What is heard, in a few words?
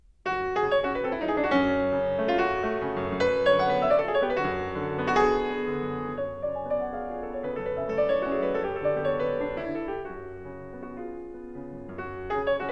Etude for piano in F sharp minor; Scriabin